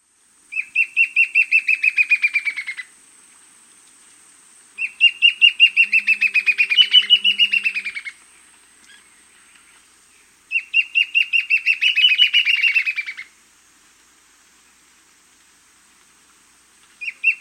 Black-Tailed Antbird; S. America

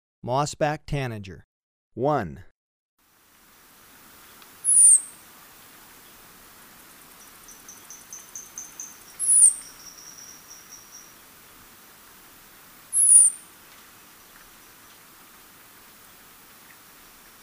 Moss-backed Tanager, Birds of Ecuador; S. America

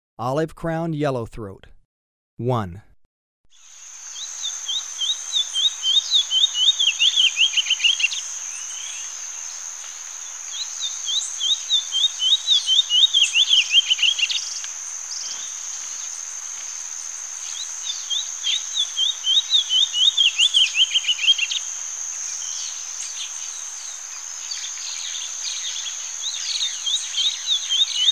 Olive-crowned Yellowthroat, Birds of Ecuador; S. America

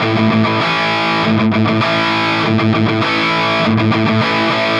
Guitar A maj, Rock

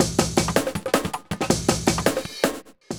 rumble 160

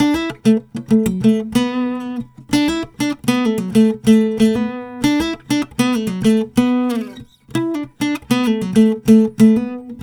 Guitar Emin, Acoustic

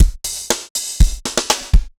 dancefloor tight 120

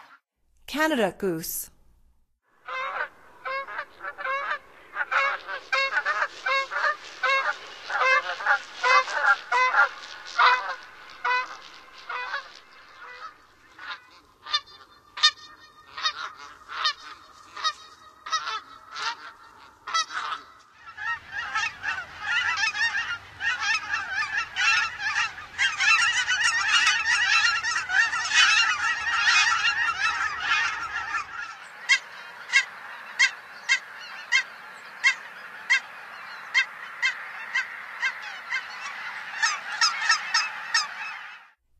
Canada Goose; North America; Stokes

